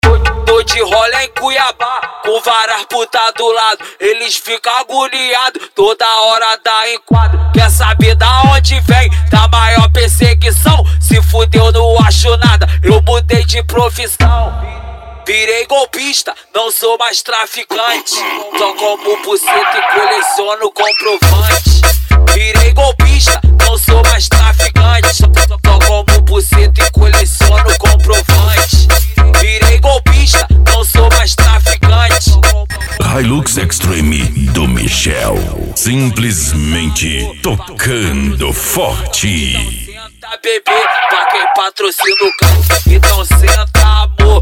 funk mega bass

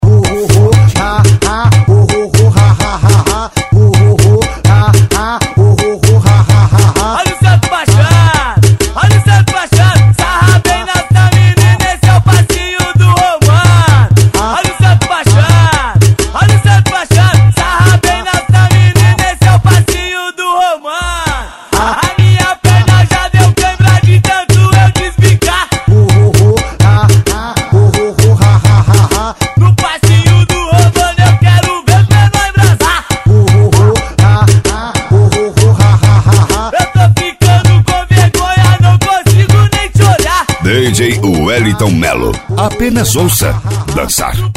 funk bass; impacto som

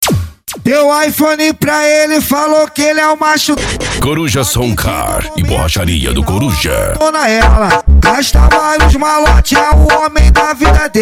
funk; coruja som car e borracharia do coruja

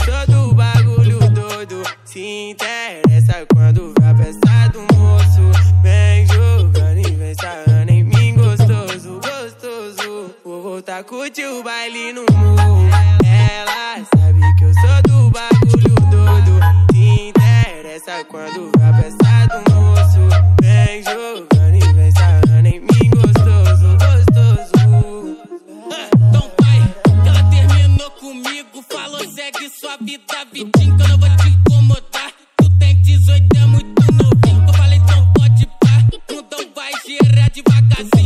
funk bass

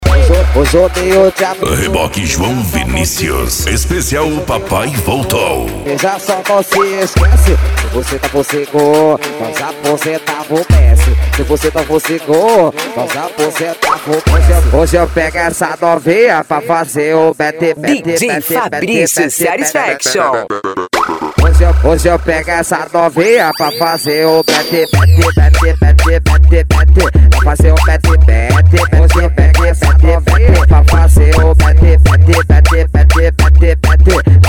funk mega bass

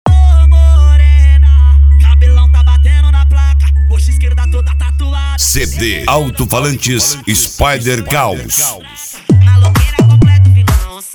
funk bass

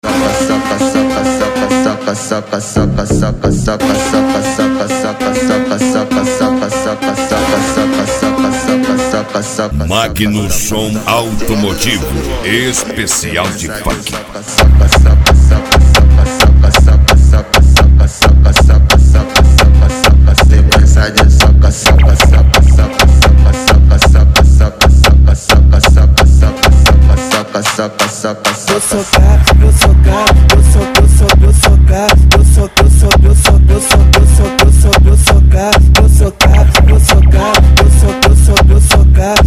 funk

